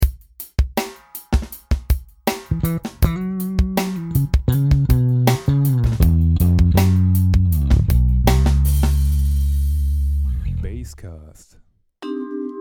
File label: Basscast; podcast